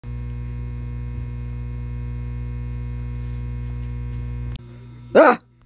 static

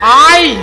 aai

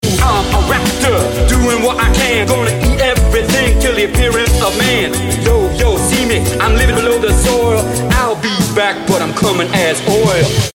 raptor rap

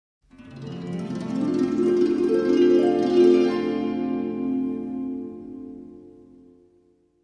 harp gliss

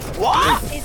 squak

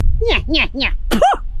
puug

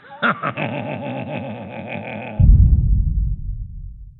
ringtone

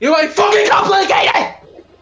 screaming; pokemon

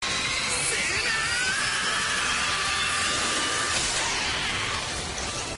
smash

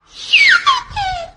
turbo whistle; meme

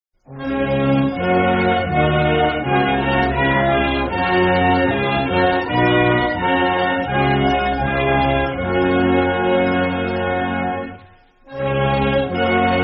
dutch anthem; f1 podium

